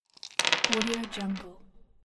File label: rolling dices on wooden surface